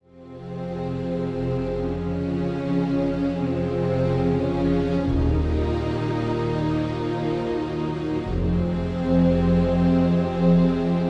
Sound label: Hymn No Choir, трейлерная музыка